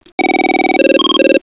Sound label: ring pattern